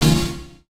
chords

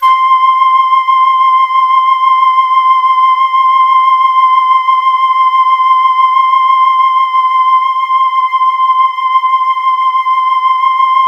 C5, Synth Line

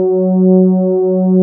PD DARK, Nord, LEAD PAD HRN; Wizoo - Powered Nord

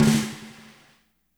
WRM SNR, WARM FAT KIT; Spectrasonics - Burning Grooves CD2

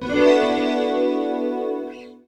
guit dream chord (A); Sonic Foundry (Sony Creative Software); Ugly Remnants VOL-2

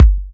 Trance Kicks

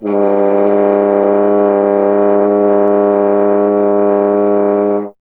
F. Horn; Miroslav Vitous; Solo Instruments VOL-2

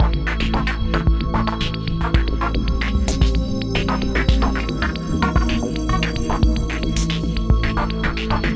philter (dark orb); Sonic Foundry (Sony Creative Software); Ugly Remnants VOL-2

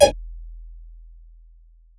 Hats, Alesis Performance Pad

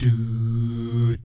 DOO, Vocal Planet Jazz; ILIO; Jazz & FX; JAZZCOMBO

